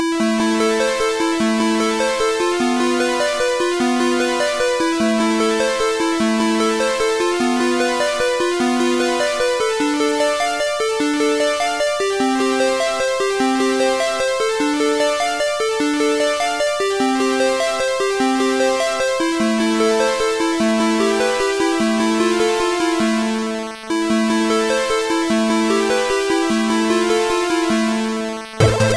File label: switpiano; Trackers; Modland